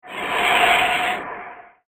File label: Industrial Flare Whoosh; whoosh sound effect; Andrew Fleming